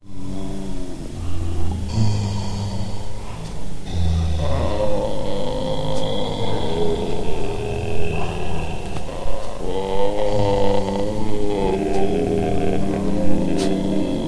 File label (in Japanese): zonbi